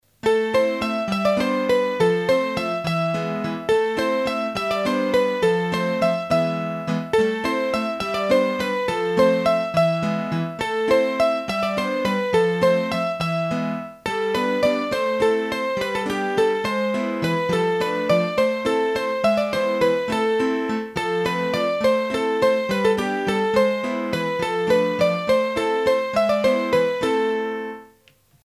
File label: Prend Garde au Loup, hurdy gurdy, Bourees d'Auvergne; Massif Central